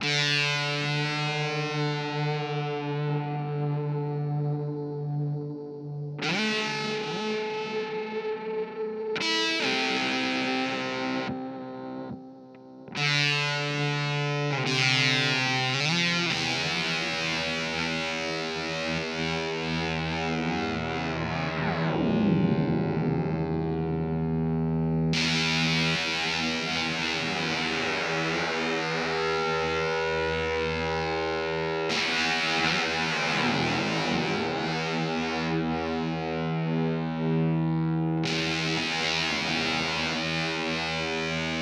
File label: Teisco